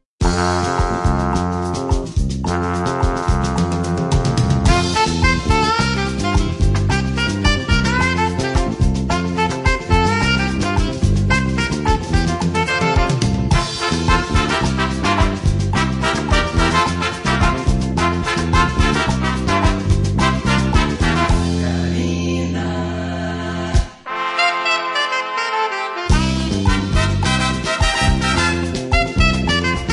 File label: Sax